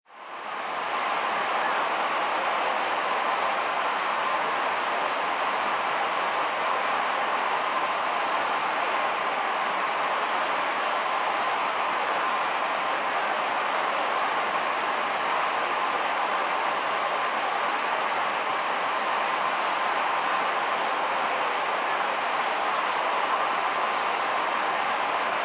Sports Crowd Large Outdoor Stadium General Crowd Noise; Crowds; Lynne Music